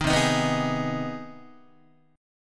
C Minor Major 7th Sharp 5th chord, Guitar; CmM7#5 strummed; JGuitar

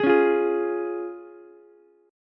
F Minor Sharp 5th F down Ukulele; Fm#5 strummed; JGuitar

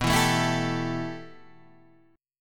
B 7th Suspended 4th Sharp 5th, Guitar, DADGAD

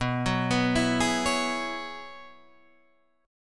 F sharp 7th Suspended 2nd Suspended 4th, B

